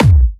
Bassdrums Clubby Kicks; Vengeance Samples

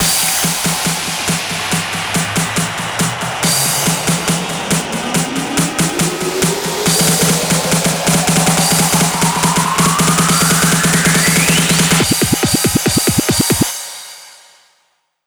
140BPM 8Bars, HandsUp & Trance Fills; LoopyFruits; Vengeance Samples

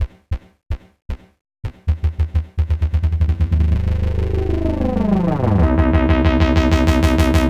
128BPM Tribal Kiss Melody

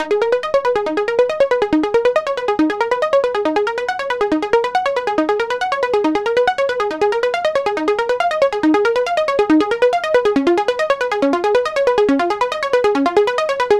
Synthline DRY 139BPM, G# minor; Melody & Synth

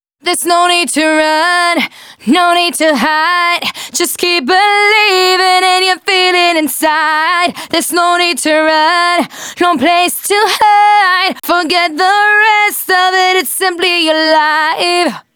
Female Vocals DRY, 128 BPM; Vengeance Samples; Vocal-Hook Kits; Song Kit; Keep Believing

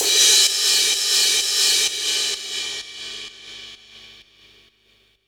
Crash, 128 BPM; Vengeance Samples; Vocal-Hook Kits; Song Kit; Keep Believing

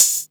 Open Hats, Cymbals

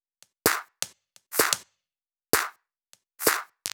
Drumloops 128BPM

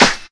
snaredrums; Vocal House; Drum & Perc; PS-28 Vocal House Samples

